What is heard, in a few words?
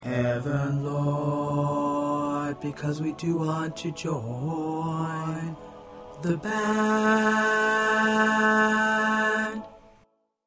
Bass 2